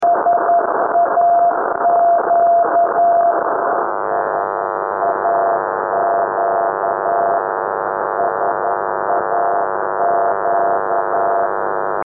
VLF morse code signals from SAQ at Grimeton in southern Sweden